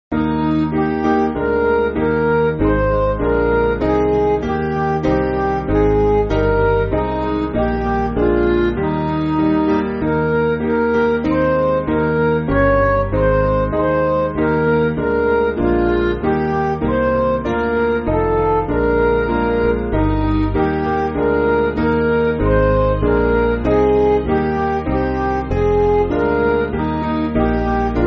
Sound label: Midi